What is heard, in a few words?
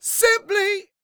SING W, Vocal Planet Blues & Soul, BLUESY; ILIO